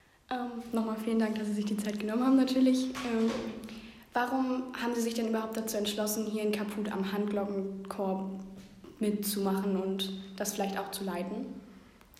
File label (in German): Handglockenchor